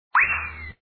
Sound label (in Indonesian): loncat; mon the monkey